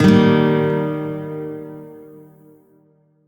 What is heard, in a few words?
Shawzin Min Chord C; Music; Warframe; Lotus Shawzin